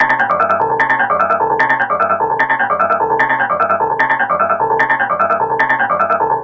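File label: gong percussion, 150; Masterbits - Tekkno Trax; SQ LOOP 150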